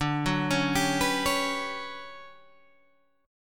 D Major 13th D down Guitar Standard; arpeggiated; JGuitar

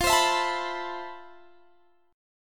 F Major Sharp 11th, Guitar, Open G; down; chord shape

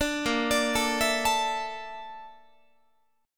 Bb Major Sharp 11th Bb down Guitar, chord; arpeggiated

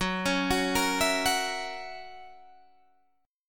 Gb 7th Gb down Guitar Standard, chord; arpeggiated; JGuitar

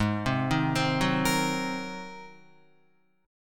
G sharp 9th G sharp Guitar; Listen to G#9 arpeggiated

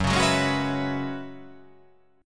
F sharp 9th Suspended 4th F sharp, chord

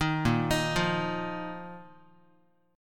A sharp Suspended 4th Guitar; arpeggiated; A#sus4; JGuitar

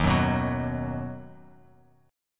Eb Minor Sharp 5th Eb down; Ebm#5 strummed; JGuitar

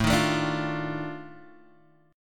F 13th A, chord

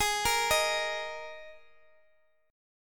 G sharp Suspended 2nd, G sharp, down

